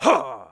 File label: attack, monster; thiefboss2